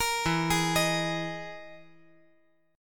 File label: E Major 7th Flat 5th